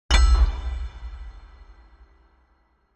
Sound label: unlock